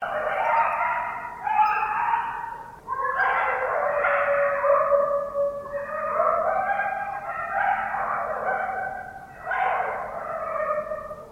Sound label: coyote